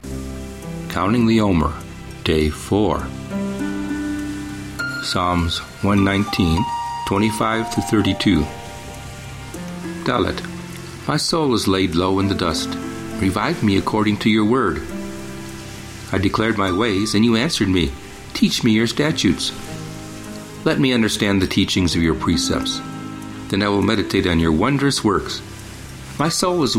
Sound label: Meditative w Waves Ocean Loop; Omer; Psa119